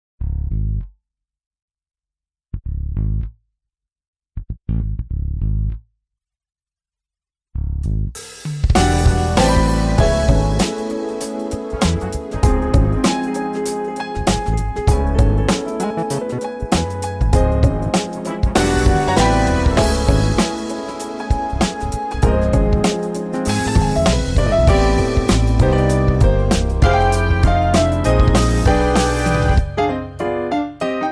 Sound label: Fusion Jazz